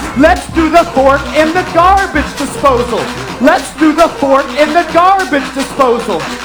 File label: ding ding ding start; slender